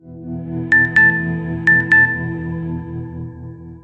nokia sms

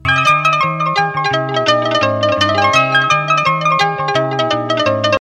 happy start

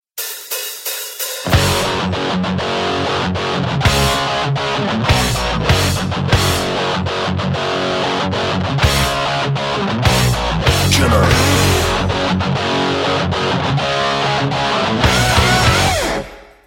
fat guitar intro

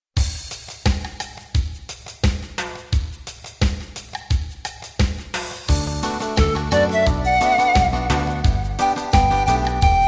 acapulco flute

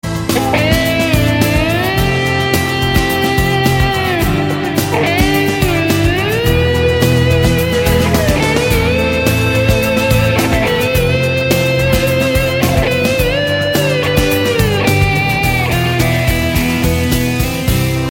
heart touching solo

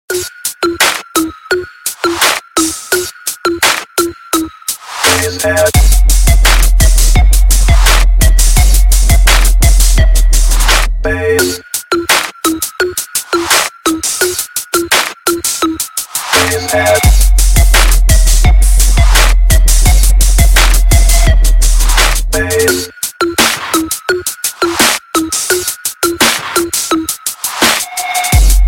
bass head; ringtone

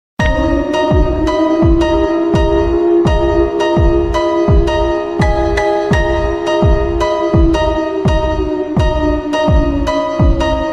gangsta bass mix

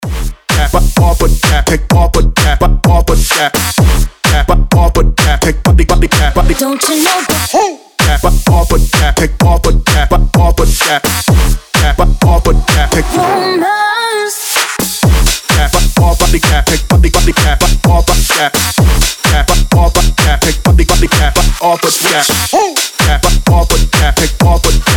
remix

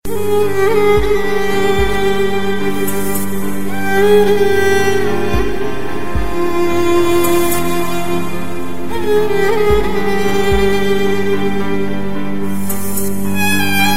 sad flute

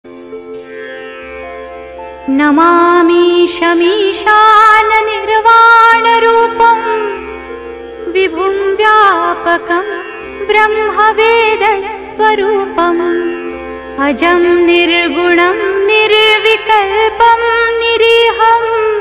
mantra; shiv rudrastak

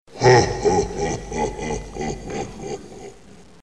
funny sms

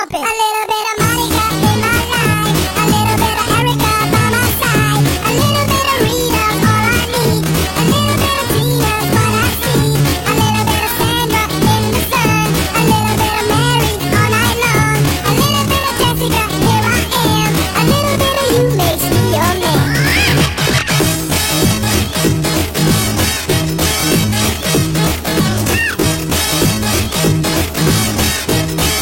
chipmunk mambo

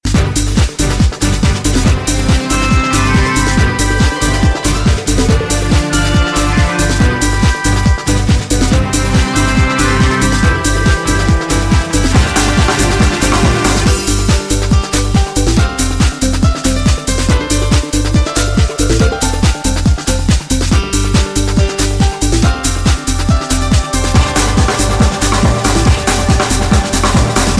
remix; Legend of Zelda: Ocarina of Time; Hyrule Field

